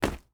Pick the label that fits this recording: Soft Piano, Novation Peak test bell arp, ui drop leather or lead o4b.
ui drop leather